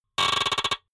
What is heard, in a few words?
typewriter sequence